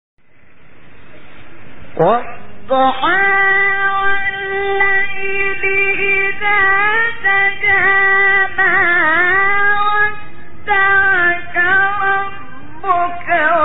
تلاوت سوره ضحی; استاد شحات محمد انور

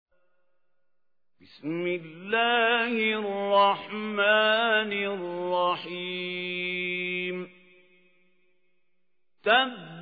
تحقیق سوره مسد; استاد خلیل الحصری

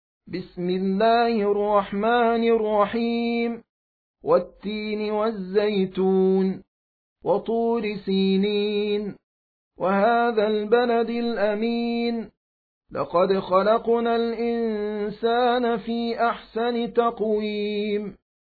ترتیل; سوره تین

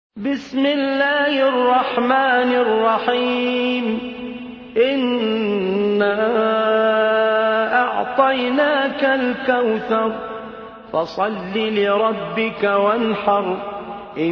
ترتیل قرآن کریم سوره کوثر; عامر الکاظمی

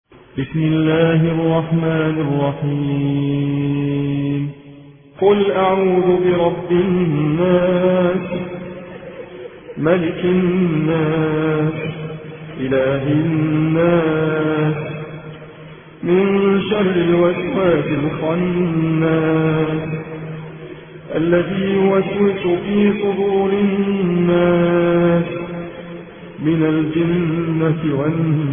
ترتیل روایت حفص عن عاصم; سوره ناس

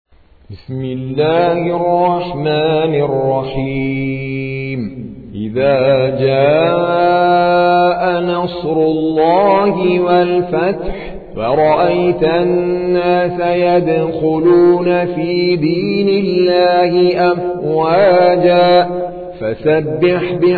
ترتیل قاری هندی; سوره نصر

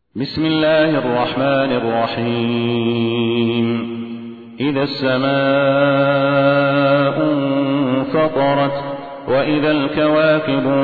ترتیل; صالح الصاهود; سوره انفطار

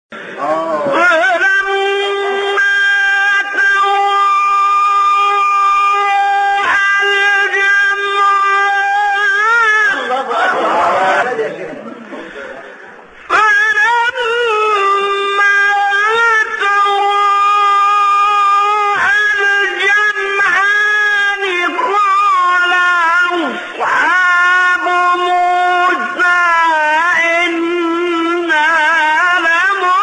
تلاوت آیه سوره; 61 62; شعرا; محمد محمود رمضان

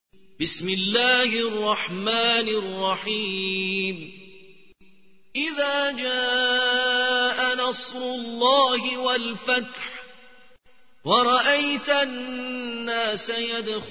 ترتیل سوره نصر

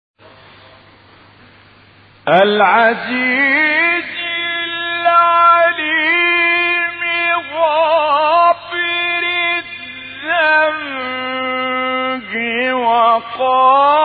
فراز ملکوتی آیه سوره; 3; غافر; استاد عبدالعال

